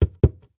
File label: Click Click; Game rip; MiSide